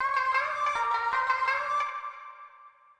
ethno, 160, C

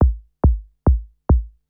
KICK TECHNO 140, Techno Drum; Techno2

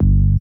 SYNTHBASS

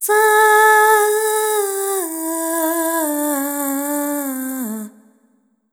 ETHNO FEMALE, Vocals; ethno2

